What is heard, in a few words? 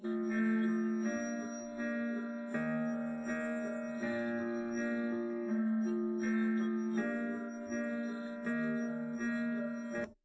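with drums, 130ms, 20cm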